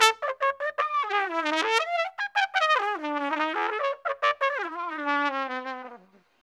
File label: TRUMPE, Trumpet FX; Roland - LA Composer vol. 2